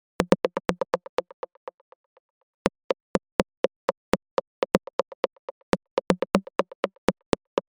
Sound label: 122 BPM Rims; mmb drum arsenal vol 1